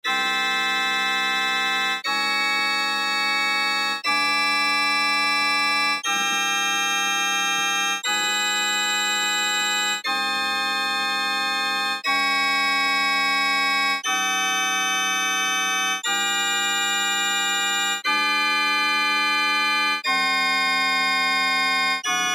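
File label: Chord Prog with Sequence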